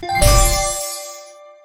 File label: token event card flash; talking tom gold run gamerip 2016